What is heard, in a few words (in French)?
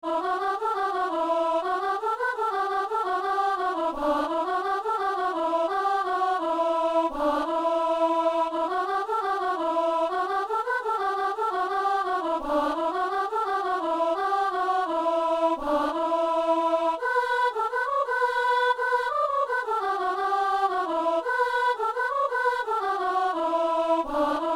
tourdion, soprano; Chorale liturgique; Secours catholique